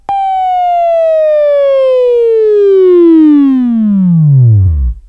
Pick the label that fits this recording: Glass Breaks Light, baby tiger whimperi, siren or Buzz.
Buzz